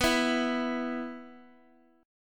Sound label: E 5th B